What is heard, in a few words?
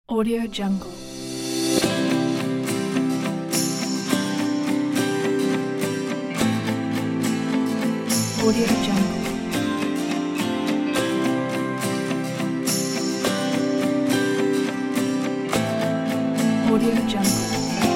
uplifting inspiring acoustic